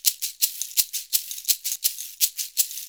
SHAKER, Modern Percussion Loops

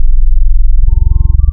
SAW, wavetables, Classic Synths

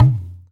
TABLA HEY; AKAI S6000 CD-ROM - Volume 5

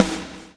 BLOW; Spectrasonics - Backbeat

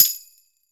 tamb on

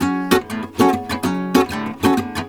GUITAR, Balkanish Traditions

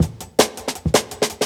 164, Jungle Breaks; 90s Sample CDs; Original Jungle Breaks

